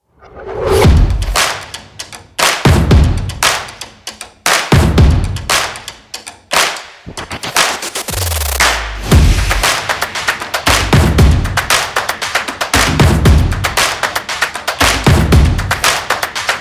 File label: Upbeat Stomp Clap and Percussion Alt No Shouts